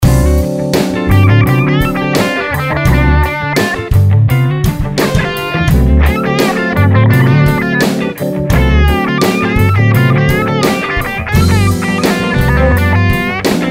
Jazz Funk 12 Bar D 85 Solo Guitar; Blues Solos

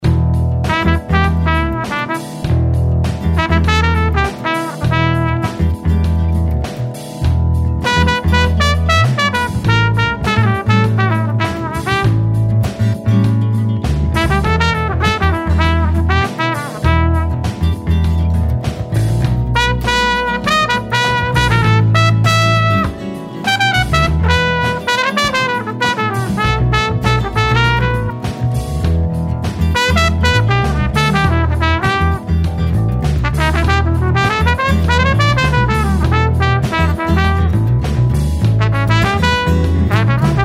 Blues Funk In E 100 Solo Trumpet; Blues Solos

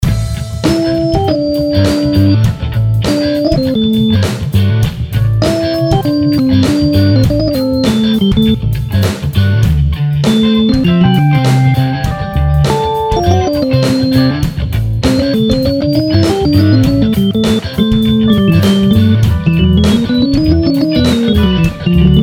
Solo Keys, Blues Solos; Funk In A 100

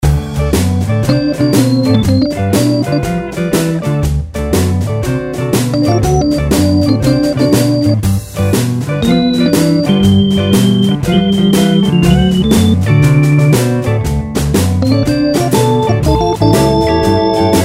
Chicago Blues In D 120 Solo Keys; Blues Solos

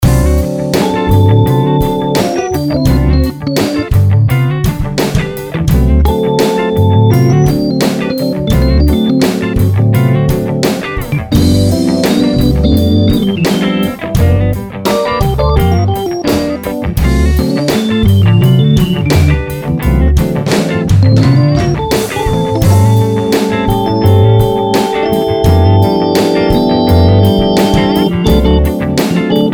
Jazz Funk 12 Bar D 85 Solo Keys; Blues Solos

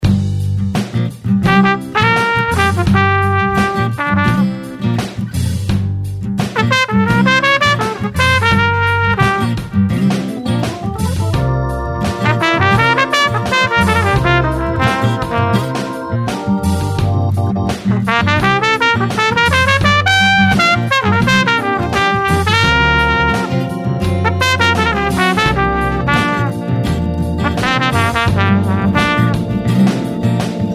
Style Blues In G 85 Solo Trumpet; Blues Solos

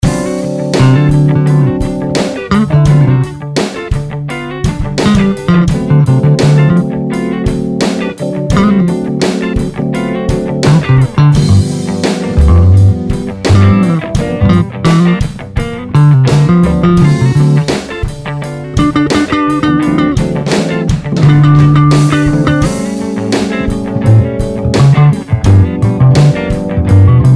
Jazz Funk 12 Bar D 85 Solo Bass; Blues Solos

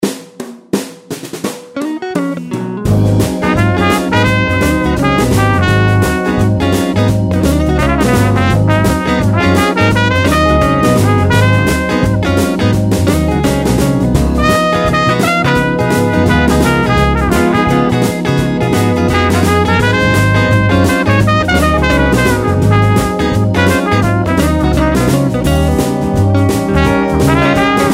Blues In Ab 85 Solo Trumpet; Blues Solos

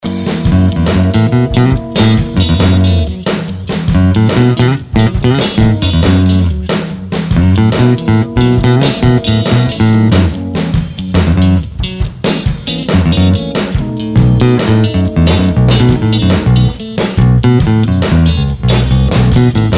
Funking Out In F Sharp 70 Solo Bass; Blues Solos

